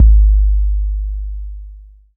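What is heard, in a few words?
kick, TR808; DRUMMACHINES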